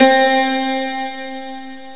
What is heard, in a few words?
string; 12-string